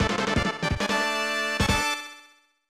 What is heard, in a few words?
Area Clear; Shonen Ninja Sasuke